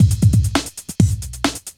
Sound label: beatbox boogie